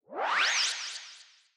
Menu SFX Scene Transition In

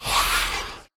Parried; Raquel

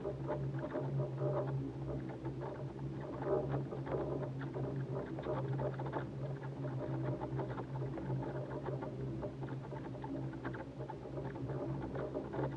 Hub Train Rattle Loop

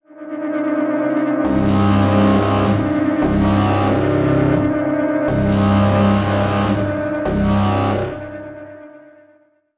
Music Irreversible All Parametric; Battlefield 2042; ps4 ps5 windows xbox one xbox series xs gamerip 2021

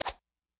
Obstacle Knittedflowers Fall; In Obstacle Knittedflowers Fall

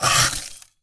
Ice Spell Freeze Small

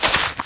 Grass Cut; GrassCut2 - Trimmed

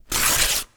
Pop Quiz paper tear

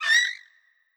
Hawk Vo Short; Hawk Vo Short S F X; Legend of Keepers

